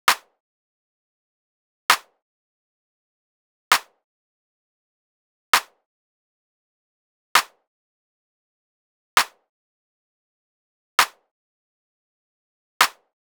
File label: drum loop 132 BPM clap; Loop Stems; Cymatics; DOPE DRUMS VOL. 1; Brunch Drum Loop